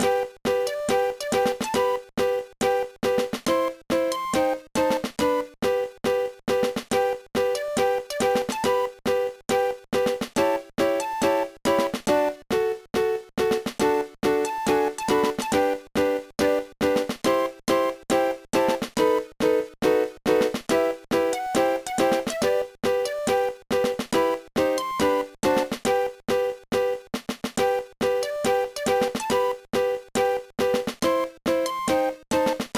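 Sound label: background music; No No No Puzzle Chailien; GBA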